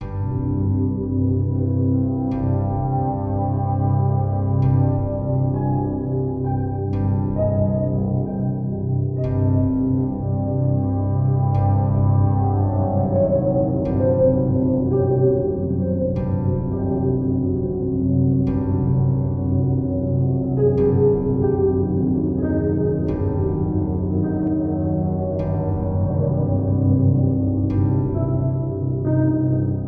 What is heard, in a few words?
distillery xen cave end